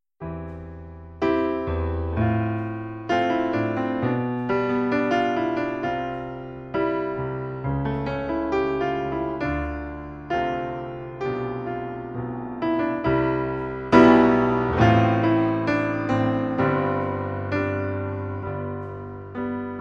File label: piano